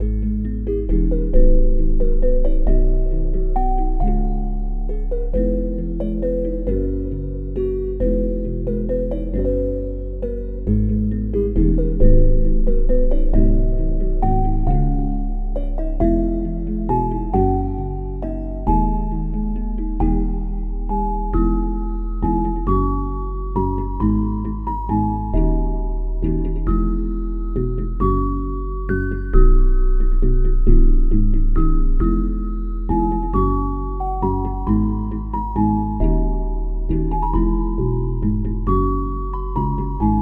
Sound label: relic song piano